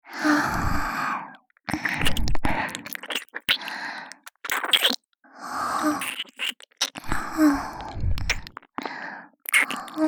お姉ちゃんの両耳キス

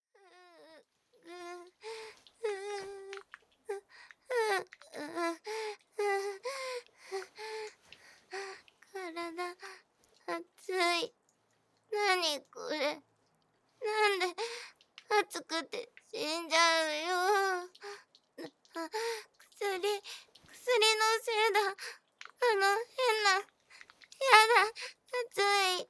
くちゅ音あり, ASMR; 自宅にて3

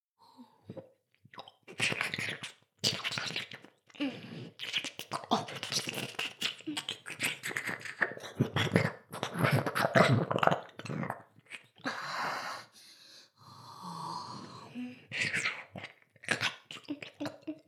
ダウナー風俗 耳舐め

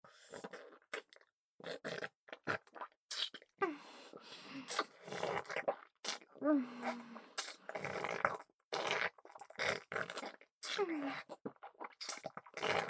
フェラループ; 通常耳舐めver；おまけ

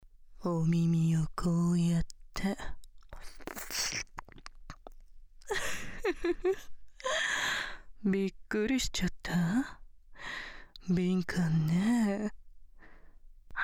耳舐めされながら; 特典①23分間耳舐めされながらじっくりシコシコ、フェラされ、おま◯こで効果のチェックをされ続ける音声

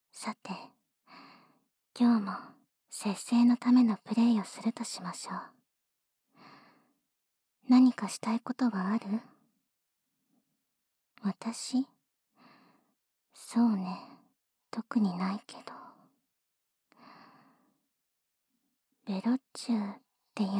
ひたすらベロチューしてあげる, ASMR; SE無し

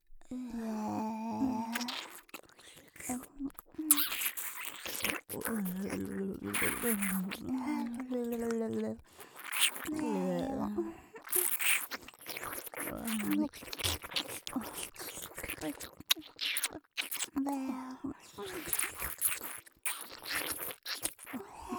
疑似フェラループ; ASMR

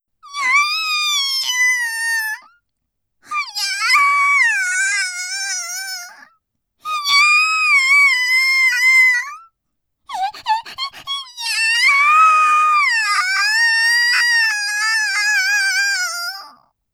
絶頂悲鳴

